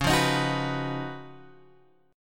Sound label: C sharp 11th C sharp; C#11 Chord {9 8 X 8 7 7} strummed